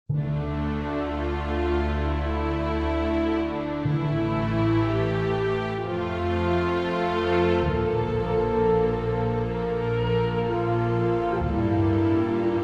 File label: Battle Orchestral